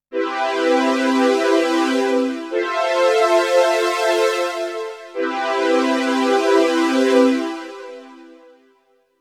Strings; ZERO G - Datafile DISC 3

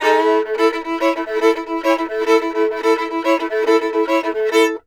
FIDDLE; Sampleheads; Whole Lotta Country 1 & 2

